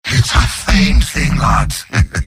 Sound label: demoman cheers; mvm